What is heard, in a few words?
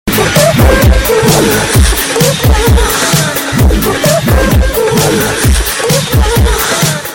Aaaah